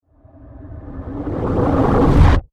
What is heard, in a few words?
Suction To Hit, Suction, Reverse, Debris, Production Element, Imaging Element, Accent, Transition; Bang; BlastWave